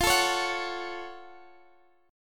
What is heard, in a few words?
Gb Minor Major 7th F